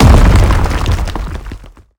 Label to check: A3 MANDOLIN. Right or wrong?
Wrong